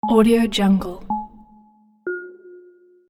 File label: countdown; شمارش معکوس ملایم